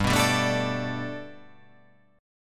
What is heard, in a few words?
G Major Add 9th chord